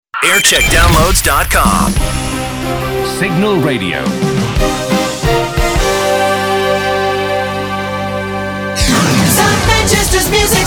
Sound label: jingle montage; Signal Cheshire; Jingle Montage (TM Century) Sept 16th 1991; 1990s